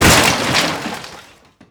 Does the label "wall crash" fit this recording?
Yes